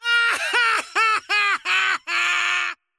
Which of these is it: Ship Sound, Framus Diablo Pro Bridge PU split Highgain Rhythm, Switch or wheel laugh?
wheel laugh